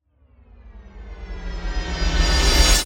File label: cinematic reverse